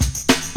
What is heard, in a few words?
KICK + SN